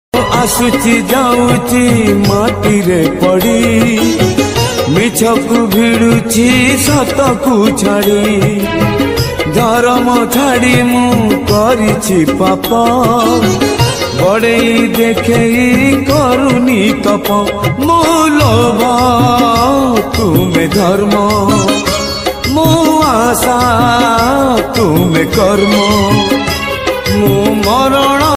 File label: Jagannath bhajan